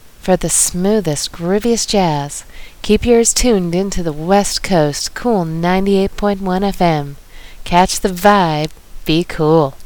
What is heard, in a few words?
station break